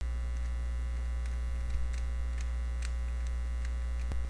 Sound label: splash